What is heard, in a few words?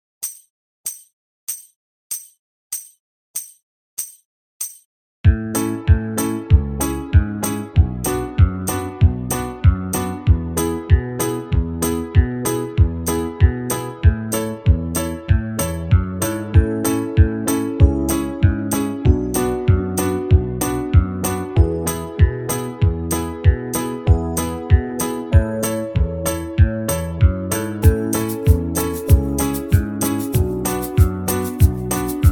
key of a slower, banjo backing tracks